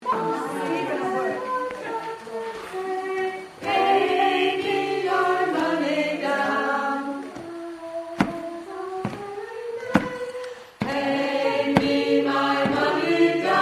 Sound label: pay me my money down alto ladies